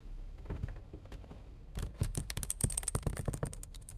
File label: small beads dropping onto leather seat